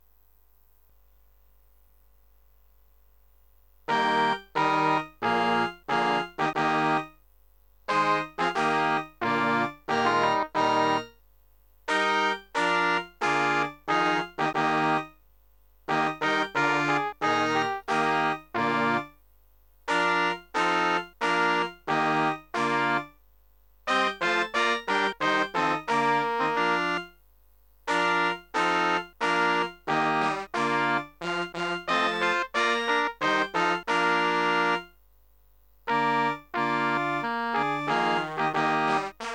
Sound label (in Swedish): Sopran, MIDI med separerade stämmor